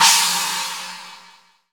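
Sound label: cymbal crash; Best Service Gigapack 2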